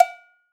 Cowbell S; Roland SPD-S

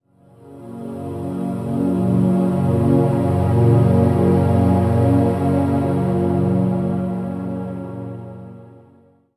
Vox Orch Chords; Drones Abstract

